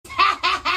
Hahaha

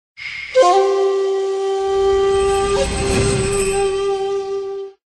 sms

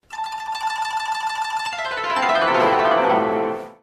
Elegant Japanese

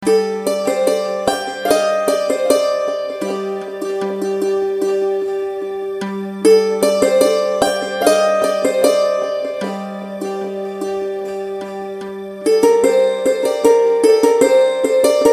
Rim Sitar

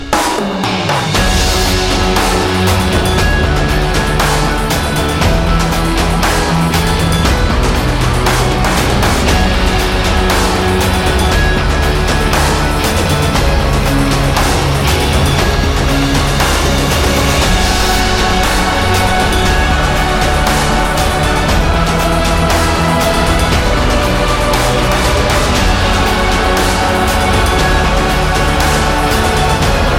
Epic Score

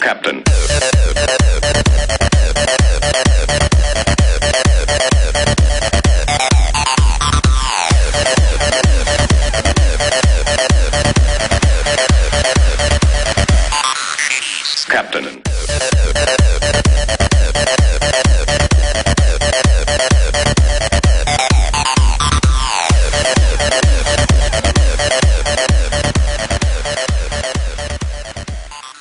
Ringtone

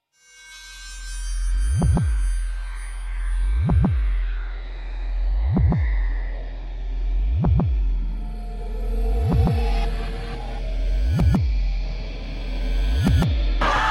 Horror; Fear

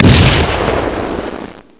Grenade Explosion

